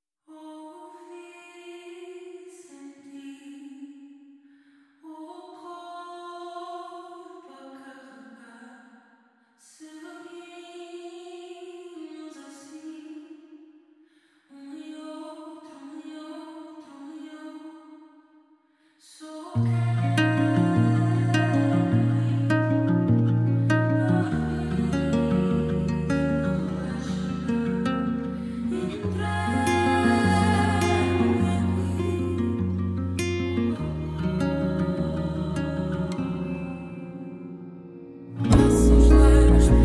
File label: Karaoke Version